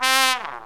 SYNTH GENERAL; Megabass Remix! 2

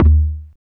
bassdrums, techno; single-sounds; single-drums

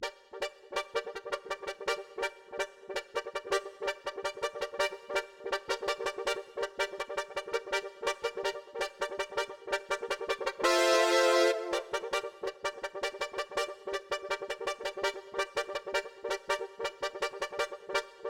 synth 1 C; Big Fish Audio; Legendary; Shes Mine

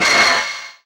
FX Dance; Sound & Vision - Gigapack II

